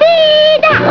bleah

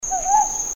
Ryukyu Scops Owl

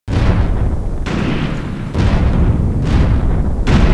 explode, explosoes; combates

